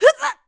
Lava

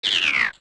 Gohma Larva Die

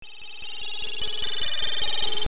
Essence Glow; An Essence (of Time or Nature) glowing when obtained; also the Rod of Seasons changing the season; Zelda Oracle

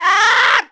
Wind Up; Bokoblin; Zelda

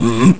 Evil Hmm; Evil guy: "Hmm?"

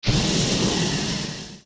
Fire Jet Short

